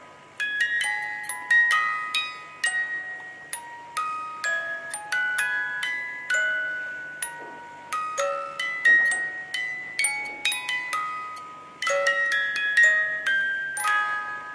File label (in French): boite a musique; chateau dans le ciel